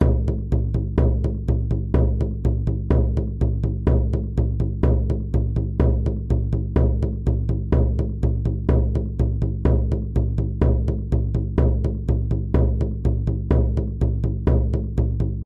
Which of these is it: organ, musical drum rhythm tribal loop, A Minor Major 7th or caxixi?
musical drum rhythm tribal loop